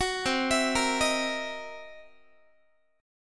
C 7th Sharp 9th Flat 5th, C, down, Guitar; arpeggiated; JGuitar

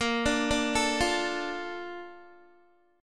A sharp Major 7th, Guitar; arpeggiated

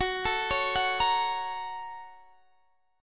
Bb Minor Major 7th Sharp 5th, Gb

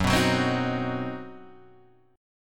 C Minor Major 7th Flat 5th, F sharp, chord

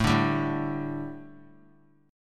A 7th Suspended 4th, A, down; A7sus4 strummed

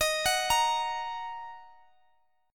D sharp Minor, Guitar, Open G

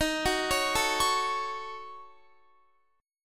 D sharp Minor 7th Flat 5th D sharp down Guitar; arpeggiated

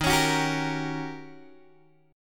D sharp 7th Sharp 9th Guitar Open G

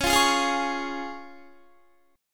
Db Major, Guitar; strummed; 20,20,20,22,20,21

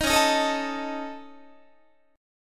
C sharp Suspended 2nd Sharp 5th C sharp Guitar; C#sus2#5; strummed; JGuitar

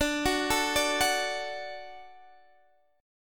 D Major D down Guitar Half Step Down

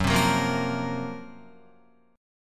Gb Major 7th Flat 5th Guitar

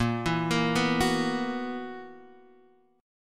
B Major 7th Suspended 4th A sharp

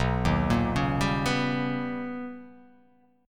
B Augmented Major 9th B, chord; B+M9; arpeggiated; x,2,1,3,1,2,1,x; JGuitar

